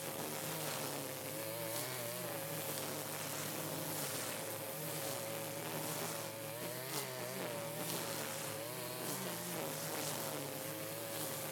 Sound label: Surface Grass